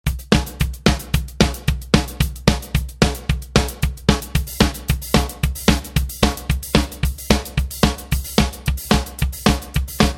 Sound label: Techno